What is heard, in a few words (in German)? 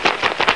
Clara Flug